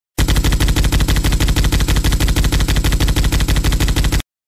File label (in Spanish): disparo de metrayeta